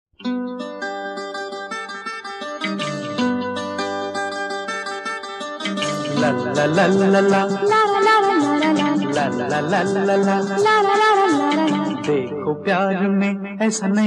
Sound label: Love tone